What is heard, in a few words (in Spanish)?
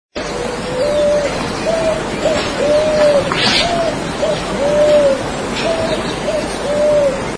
voz picazuró